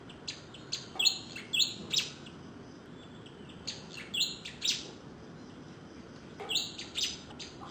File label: Pied Fantail Flycatcher call; Amar